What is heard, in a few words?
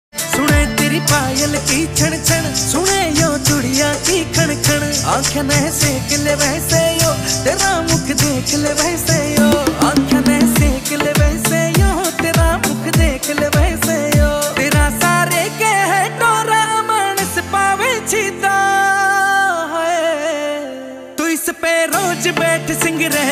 haryanvi ringtone